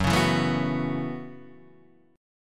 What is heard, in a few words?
Gb Minor 11th Gb Guitar DADGAD